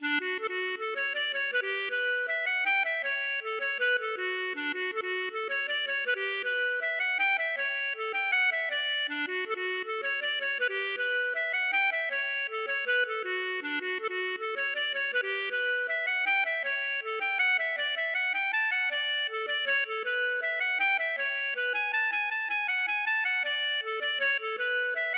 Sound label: mazurka